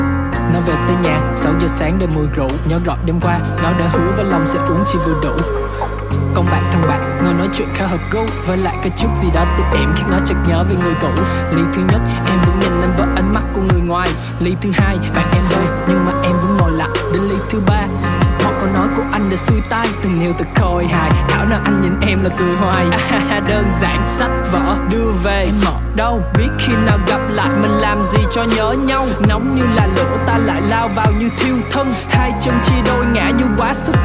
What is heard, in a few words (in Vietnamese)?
Nhac Chuong Rap